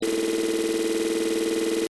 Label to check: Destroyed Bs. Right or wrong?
Wrong